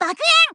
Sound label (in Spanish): Llama explosiva; JAP; Kirby; SSB4